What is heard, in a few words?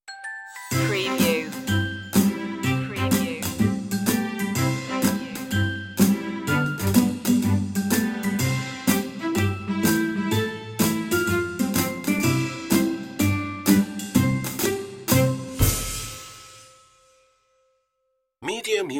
toy soldier alt mix